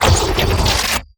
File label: Teleporter Warp Blast Explosion; Evolve; Wraith